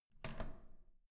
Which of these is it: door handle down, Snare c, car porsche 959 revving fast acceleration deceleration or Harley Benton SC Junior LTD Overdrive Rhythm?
door handle down